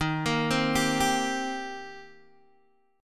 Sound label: Eb 6th Eb down Guitar Open G